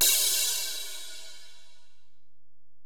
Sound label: Ride, Alesis DM-PRO, Drum Machines